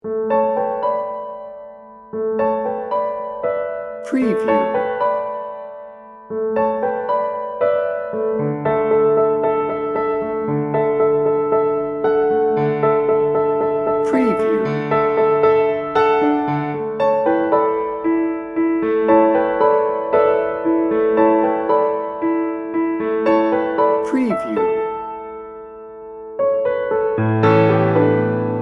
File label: piano only